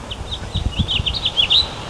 house finch, birds

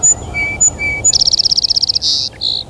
song sparrow

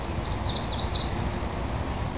blackburnian, birds

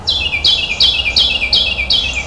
carolina wren, birds

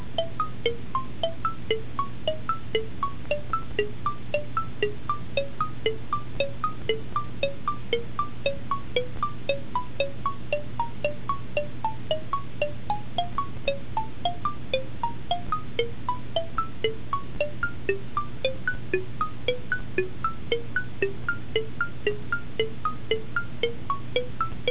tide sound

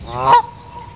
canada goose, birds

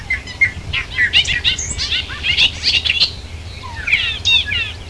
bobolink, birds

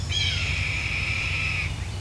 red tailed hawk, birds

